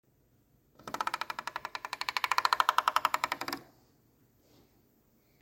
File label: Wood